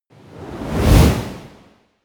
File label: whoosh